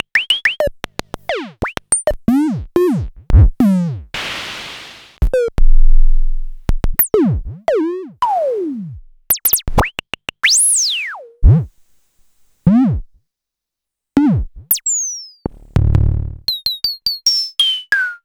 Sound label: Klone Dual Percussion Synthesiser perc